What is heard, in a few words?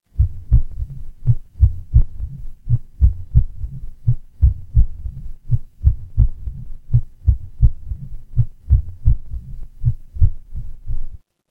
horror heartbeat